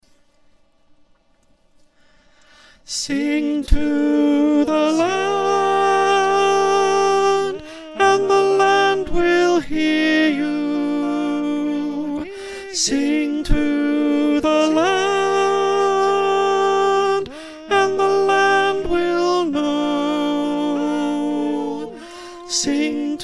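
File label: alto, choir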